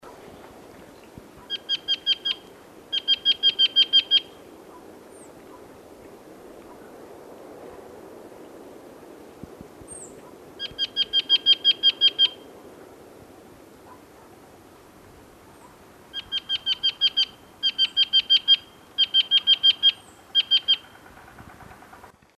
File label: yunnan nuthatch